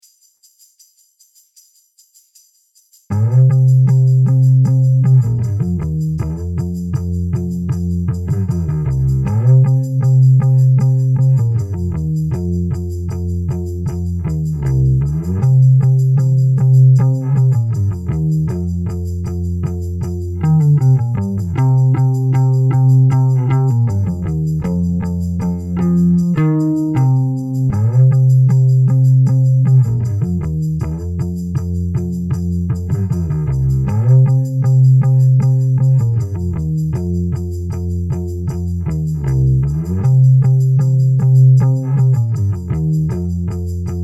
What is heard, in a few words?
Basline in 8 notes, 78 bpm